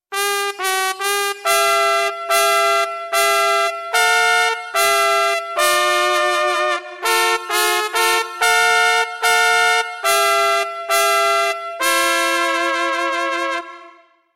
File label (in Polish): trąbka